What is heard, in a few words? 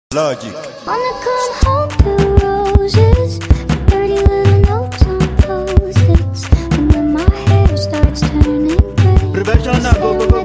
Professional beat; mara beat